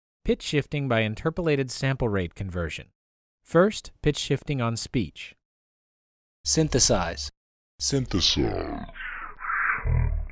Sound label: Pitch Shifting by Interpolation